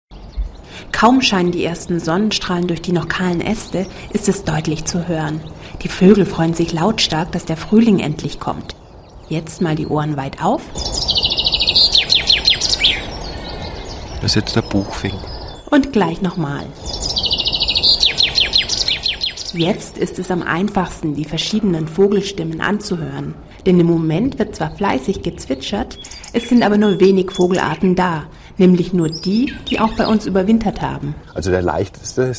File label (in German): vogelstimmen